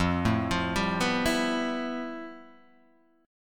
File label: F Major Sharp 11th; arpeggiated; JGuitar